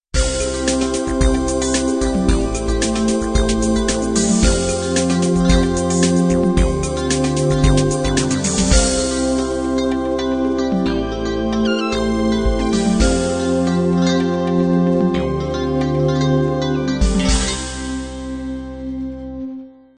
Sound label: 16 beat analog end